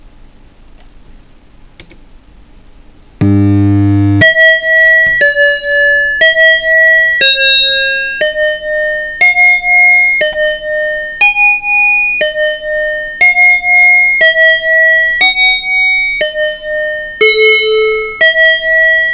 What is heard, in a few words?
bells